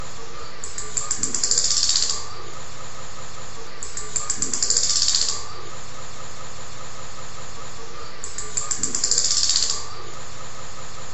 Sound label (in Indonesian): ak betina